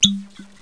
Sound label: corkpul